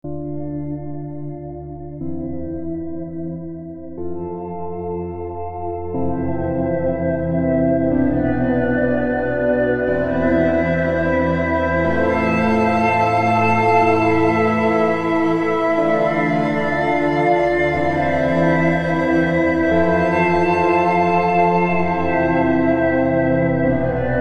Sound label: Pad Space Flower 122BPM Dm; Unison Artist Series; Mac Depulse